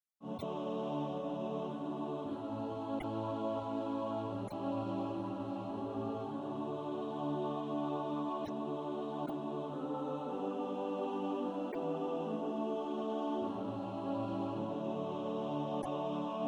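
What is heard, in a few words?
alto, Practise Music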